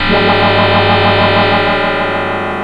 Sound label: pulsar, electronic sounds; Sound Effects for Multimedia - Volume 1